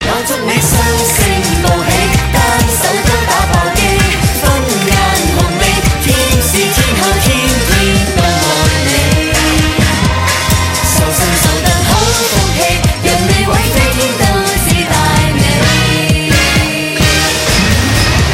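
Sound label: chinese new year song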